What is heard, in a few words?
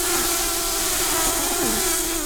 bumblebees, Pascuorum queens